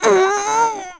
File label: Stretch; Link stretching; zelda sounds